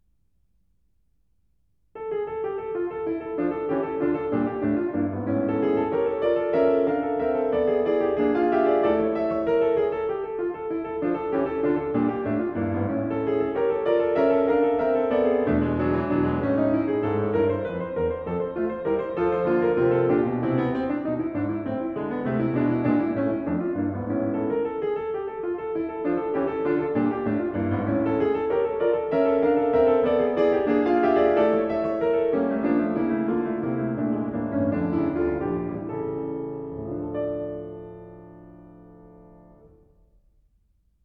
Small Piano Pieces, Allegretto con moto; Grieg; The Complete Music For Piano

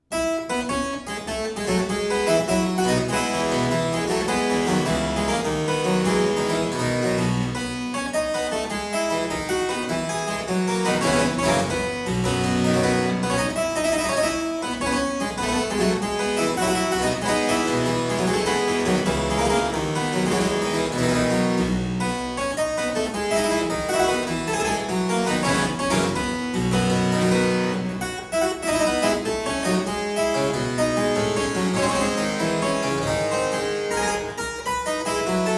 Suite in F major Gigue, Harpsichord; Buxtehude; Complete Harpsichord Music

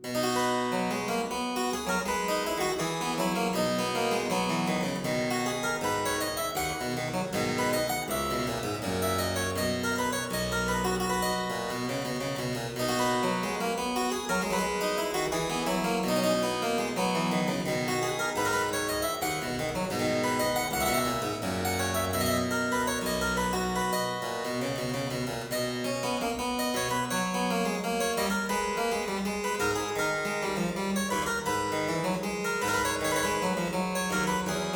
Aria in C major - Variatio, harpsichord; Buxtehude; Complete Harpsichord Music; Aria 'More Palatino'; BuxWV247; Variatio 3

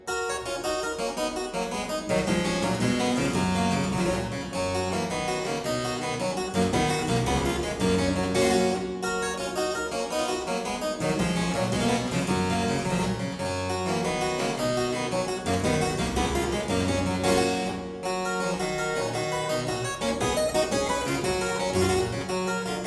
Aria in G major Partita 23, Harpsichord

